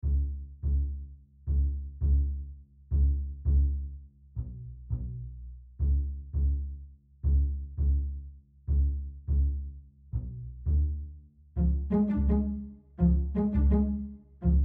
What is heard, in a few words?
orchestral sketch